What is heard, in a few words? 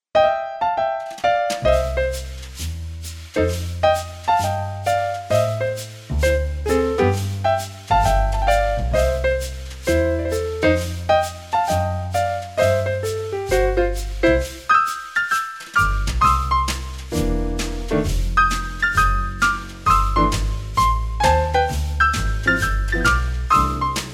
classic jazz